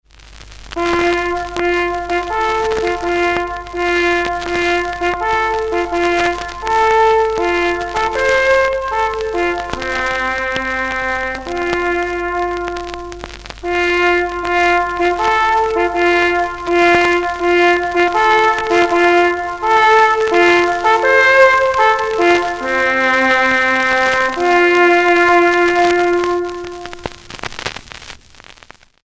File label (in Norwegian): spisesignal, leirsignal